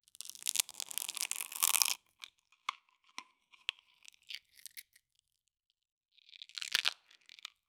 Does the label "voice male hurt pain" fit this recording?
No